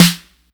Snare Spectral, 909 Snares; Slate; Vintage Drum Machines Volume 3 909 Edition

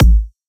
kick one shot, drums; Pop; SD PASADENA Pop Sample Pack; Slate

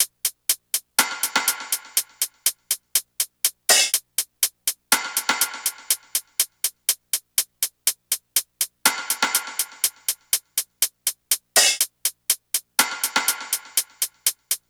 perc, Drum Loops, Top Loops, 122; SD MIDNIGHT Sample Pack; Slate

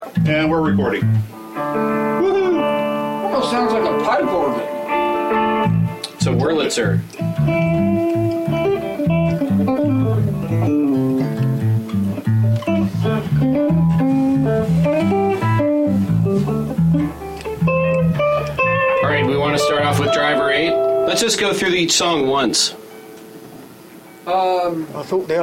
Background binaural

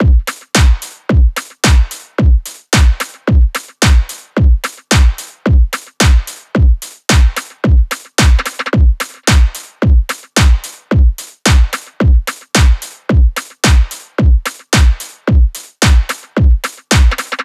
drums 110, Full Drum Loops; drum sample pack; Lipari; SEISMIC; Slate

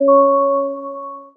bell energy C#; Melodies; One Shots; Slate; AURORA Sample Pack

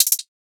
hat, drum sample; Hats

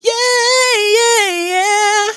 riff Fm, Vocal, Dry Vocals; Raw Vocals; Slate Digital Omnivox Vocal Sample Pack

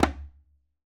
djembe Single Hit Flams; Raw Perc One Shots; Slate; SD SEISMIC Drum Sample Pack

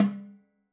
timbales High Center; Raw Perc One Shots; Slate; SD SEISMIC Drum Sample Pack

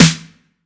Snare Voltage, 909 Snares; Slate; Vintage Drum Machines Volume 3 909 Edition

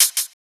hat stereo, Closed Hat, Drum One Shots; SD MIDNIGHT Sample Pack; Slate

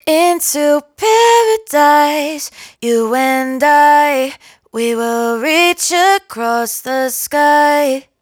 hook 128 Gm, Dry Vocals; Raw Vocals; Into Paradise; Slate Digital Omnivox Vocal Sample Pack

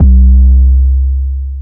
808 drifter C, Tonal; Hip-Hop; Slate; CAICOS Hip-Hop Sample Pack

